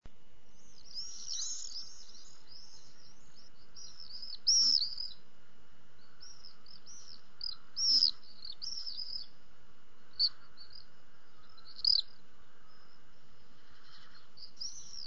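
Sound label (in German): mauersegler, Vögel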